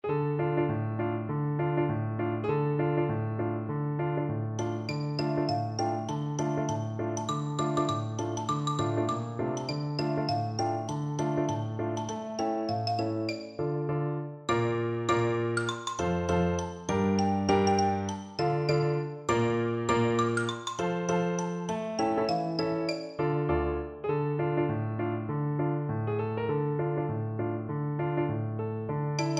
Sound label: wellerman, percussion